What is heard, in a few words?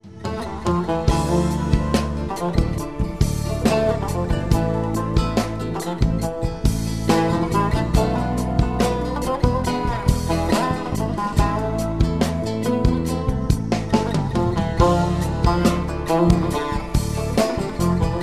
turkish ringtone; android